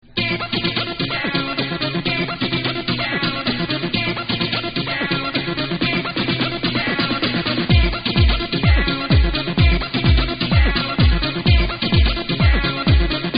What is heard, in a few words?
techno